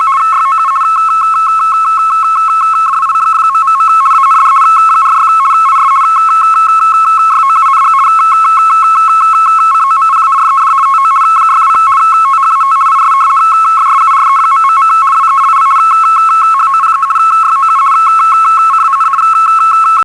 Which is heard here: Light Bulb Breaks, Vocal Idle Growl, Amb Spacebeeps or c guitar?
Amb Spacebeeps